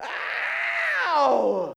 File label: scream fake; ooh ahh